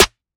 Snare G#; Cymatics - Astro Bystander

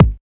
kicks

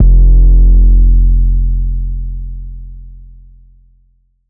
808 F; Cymatics

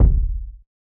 kick one shot long; Kicks

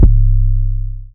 808s

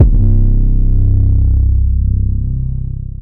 Not Nice 808's

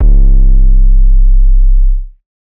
Bass 808

